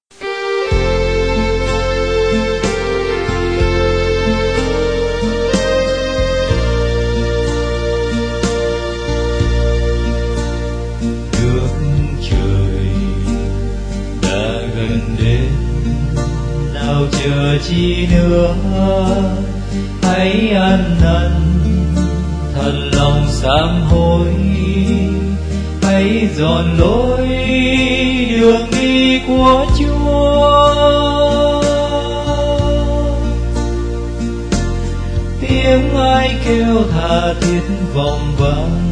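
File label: soprano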